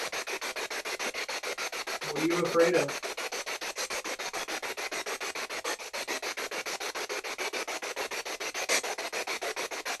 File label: Spirit Box; Hope Doll Room; Archive of the Afterlife